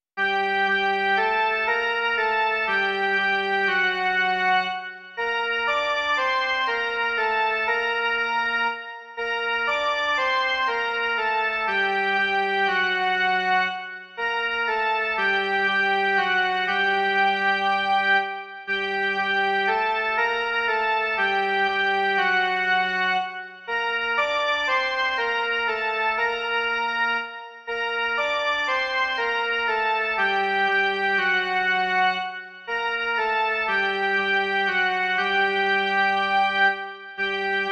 jen melodie; Ó Bože, jenž jsi Pánem nás

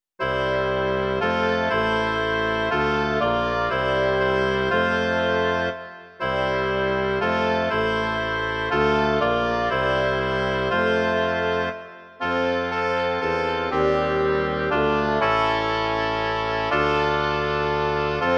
2 hoboje, lesní roh a kontrafagot; Sláva, sláva! Pán z mrtvých vstal!; Imaginativní verze

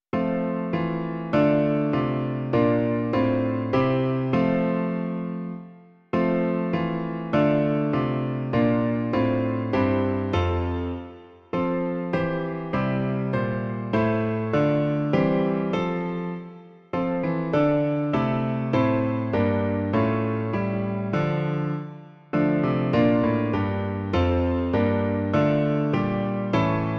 klavír; Ó králi věků důstojný; Klavírní verze

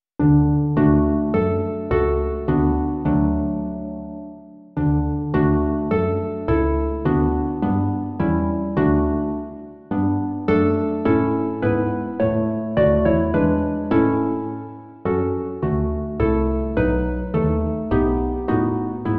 harfa a kontrabas; Živ dlouho ten; Imaginativní verze

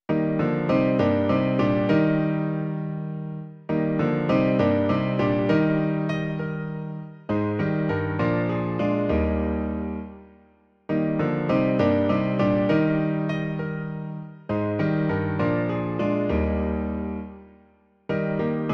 Co se tak svítí v Betlémě - klavír; Klavírní verze

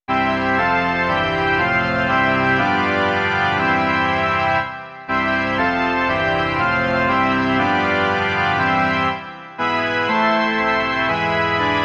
varhany; Varhanní verze; Ó požehnej nám, Pane

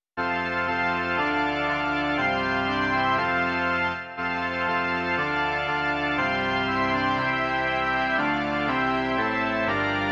varhany; Varhanní verze; Ó náš milý Bože

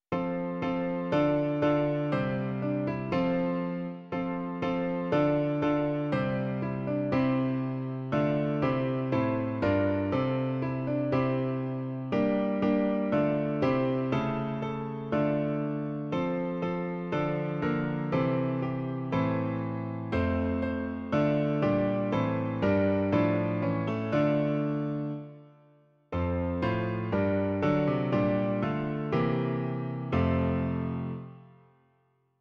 klavír; Klavírní verze; Ó náš milý Bože

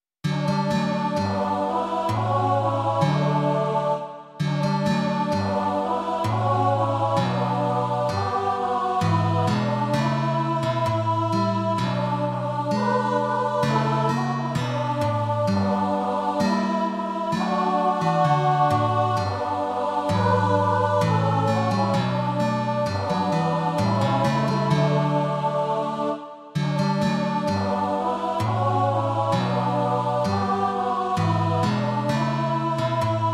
dětský sbor a kytara; Tobě, Pane, patří dík; Imaginativní verze

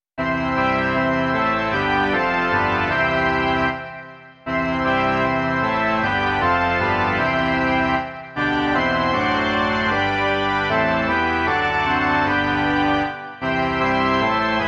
varhany

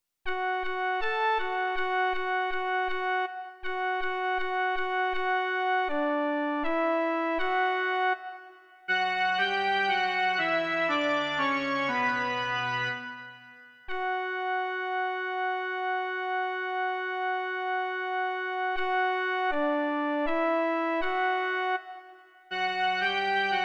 S myslí mírnou a pokornou - jen melodie; Doprovody Farského liturgie

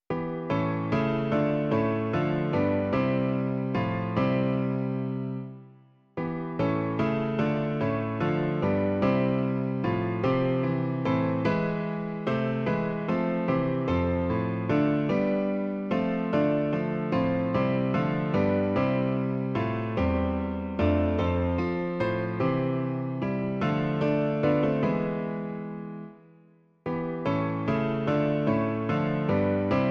klavír; Navštiv nás, Kriste; Klavírní verze

